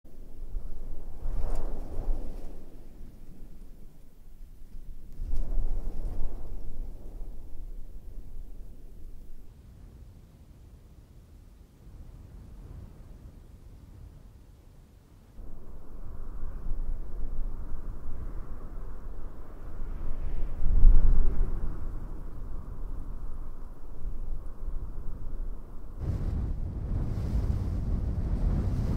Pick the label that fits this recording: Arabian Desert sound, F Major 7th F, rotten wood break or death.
Arabian Desert sound